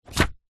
foley paper sheets construction sugar set down on surface; zapsplat